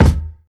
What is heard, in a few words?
thick kick sample D# key, Royality free kick sample tuned to the D# note; kick drum samples; one shots